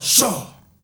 SHAWSHAAA R, GRP CHANT, Heart of Africa; Spectrasonics; Heart of Africa 1

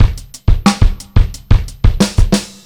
JZ 90 DRUM, ACID JAZZ

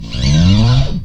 BS CHPSTK, Chapman Stick

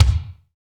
KICK FUNK, Ambient Kick; Roland L-CD701; Ambient K1

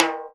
snare, timbales; Univers Sons; Basicussions

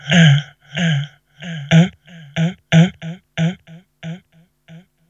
VOX, Hip Hop R n B; USB Soundscan vol.19; AKAI; HHFX 89

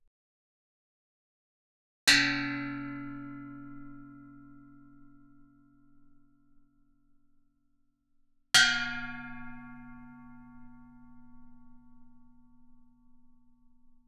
Gamelan; Propeller Island - Complete Gamelan

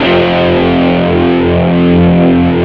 power 5ths F

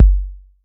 BD 808; Wizoo - Platinum 24 Electronic; CHR BD 808

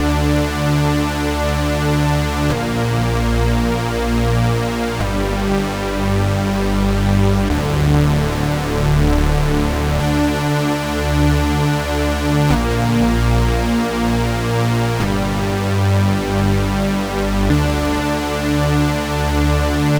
Tres Synth; Sonic Foundry (Sony Creative Software); Club Joints and Street Anthems; Toxic Rain

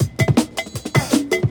160 bt, breaks, breakcore

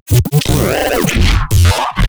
glitch stab; Virtual Riot; Glitch Stabs

